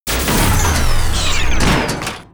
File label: WAR DIE; Mob